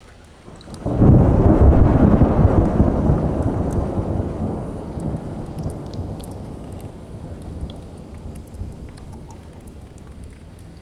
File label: distant thunder rumbling and water dripping; weather